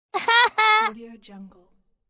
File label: boy ha ha